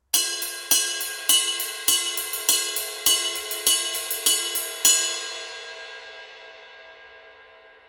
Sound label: 22in Dark Medium Ride, cymbal